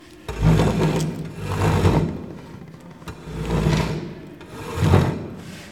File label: School Desk In Chamber